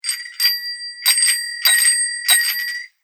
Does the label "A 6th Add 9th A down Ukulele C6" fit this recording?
No